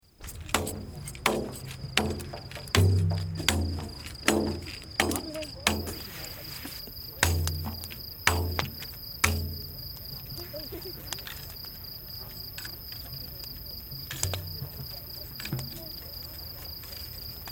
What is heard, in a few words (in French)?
ambiance le soir autour d'un feu